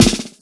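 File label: snare roll, Ragga & Dub Reggae; USB Soundscan vol.27; AKAI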